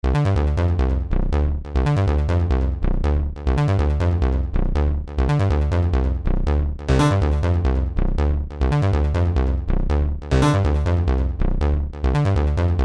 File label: In Trance